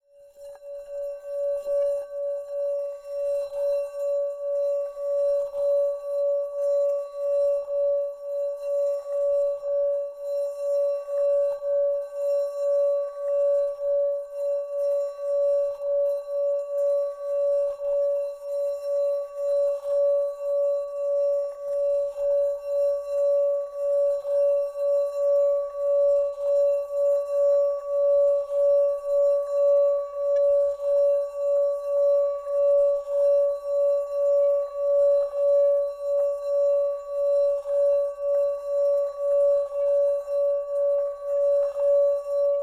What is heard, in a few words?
Medium Painted, himalayan bowls